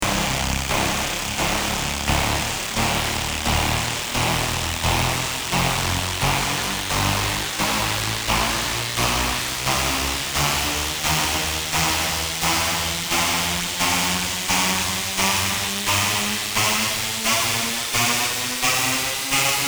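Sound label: 174 fx pitch riser, Drum & Bass; Tech Flavours; Drum & Bass Sample Pack inspired by the sub-genre of Tech Step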